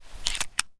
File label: Super Shorty Load; zps; Weapons; Firearms